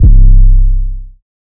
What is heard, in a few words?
808 - C; Drum Samples; Toast; Cymatics; PHARAOH